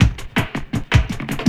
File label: LOOP, 160 BPM, Drum & Bass